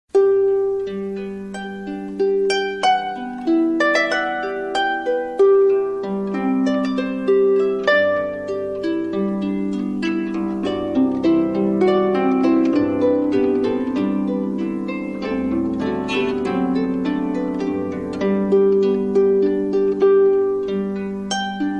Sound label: Harpbeat